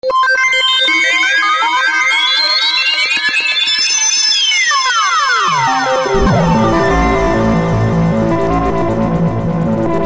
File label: sandblasted